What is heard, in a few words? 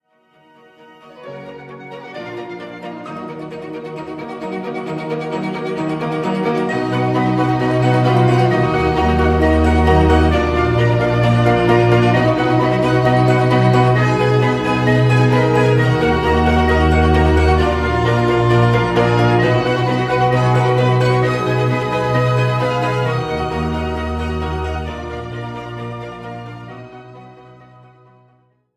Instrumental